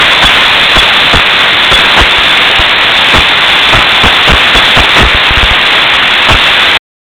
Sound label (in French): purgeur